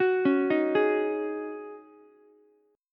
Db Suspended 2nd Suspended 4th, Ukulele, Dbsus2sus4 chord; arpeggiated; JGuitar